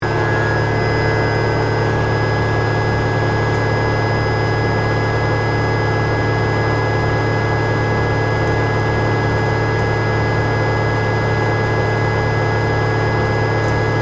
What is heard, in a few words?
Ice Rink Motor, Refrigeration, Compressor, Run; Industry; Miscellaneous Bar